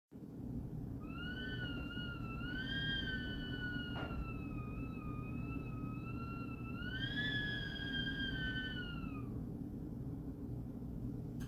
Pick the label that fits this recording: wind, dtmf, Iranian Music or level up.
wind